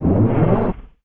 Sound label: Grave Heal; Sid Meier's Civilization 4 Complete; Beyond the Sword; Units